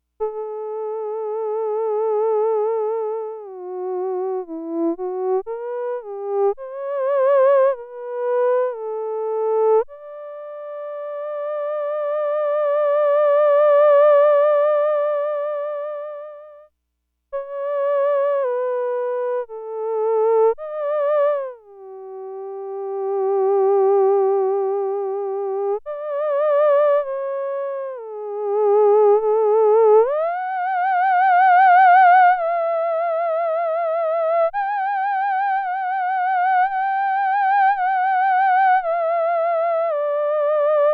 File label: solo